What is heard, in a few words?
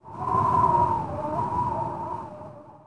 WIND, wind